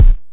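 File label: 909 bass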